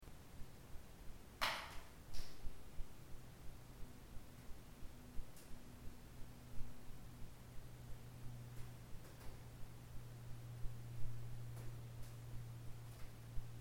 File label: basement boiler room rock falling